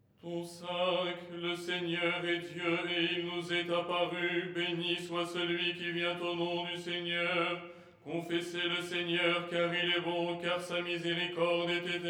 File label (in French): tenor; " Le Seigneur est Dieu… "; Les 8 tons en français